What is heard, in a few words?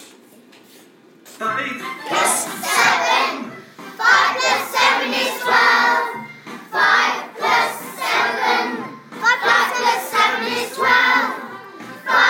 jingle; speedy big maths